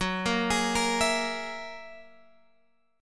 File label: Gb 9th Gb down Guitar Open G